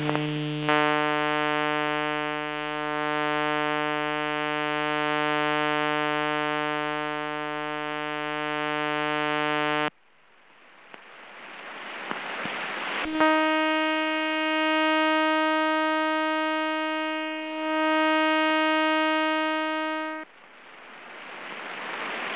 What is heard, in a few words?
Iranian Radar 150-313 sweeps-sec AM